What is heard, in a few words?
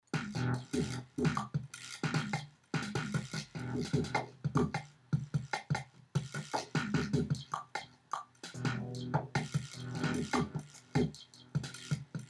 NI Battery 4 funk kit